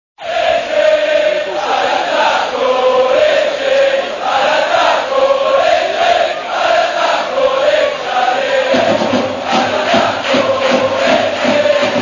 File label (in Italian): cori; 1981lecce; all'attacco